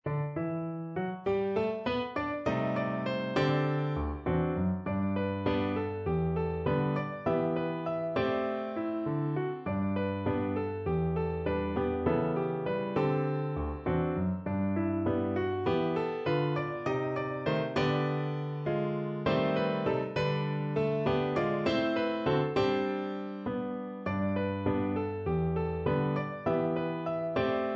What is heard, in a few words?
rollicking ragtime